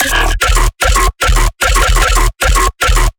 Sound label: bass loop crunchy times 150 D; Virtual Riot